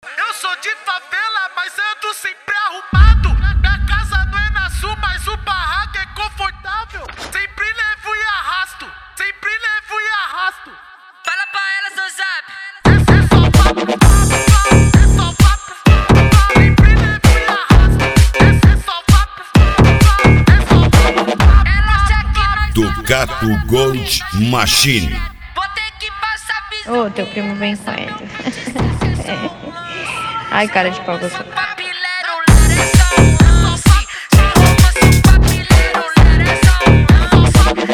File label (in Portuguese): mega funk